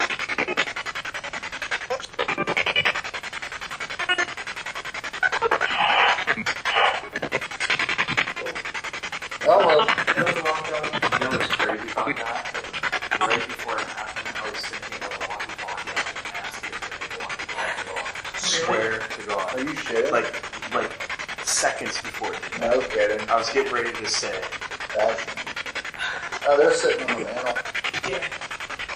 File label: Spirit Box; 2nd Floor Bedroom